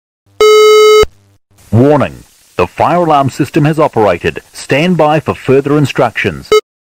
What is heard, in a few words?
fire alert